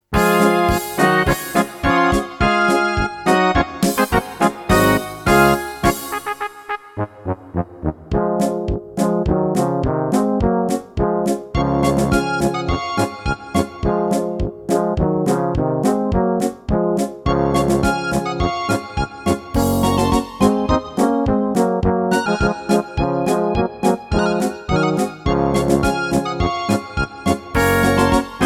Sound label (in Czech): Ceska lidova